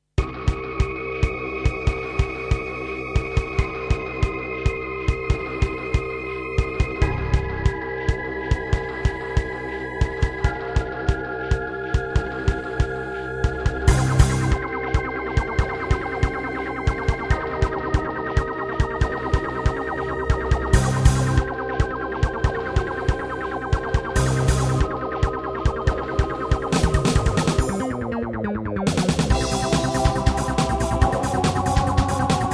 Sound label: Roland SC-8850; sigil doom episode 5